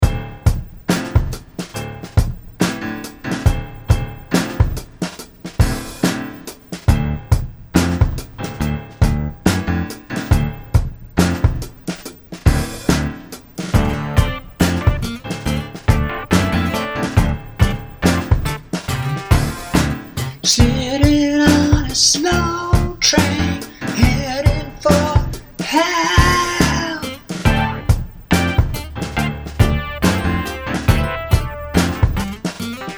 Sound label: Slow Train